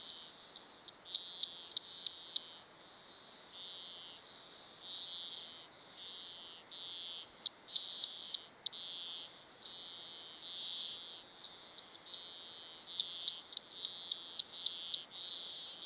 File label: Dendropsophus cachimbo